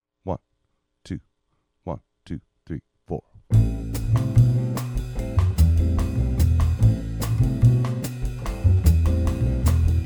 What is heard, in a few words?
latin rhythm section piano muted